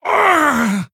male pandaren, Death

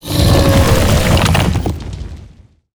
Death

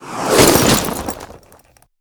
Robes of Protection Death

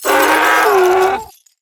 Crystal Stag Attack

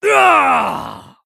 Male Night Elf Death

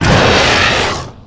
Ravasaur Attack

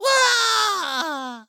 Death

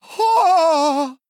Human Male Death

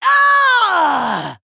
Female Night Elf Death

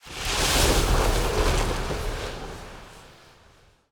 Colossus of the Moon Attack Underlay

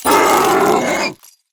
Crystal Stag Play

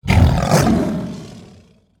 Tiger Play

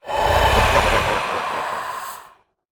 Shadow Play

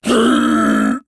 Male Fel Orc Death

